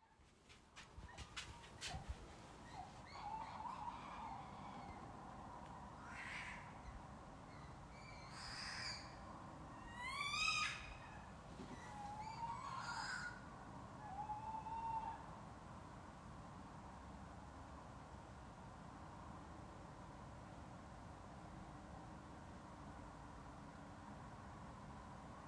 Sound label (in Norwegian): ukjent fuglesang